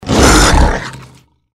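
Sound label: deathclaw power attack; npc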